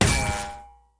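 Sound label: ricochet; StarSiege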